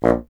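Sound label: wind bassoon A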